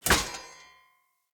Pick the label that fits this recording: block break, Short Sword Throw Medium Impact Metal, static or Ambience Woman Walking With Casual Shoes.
Short Sword Throw Medium Impact Metal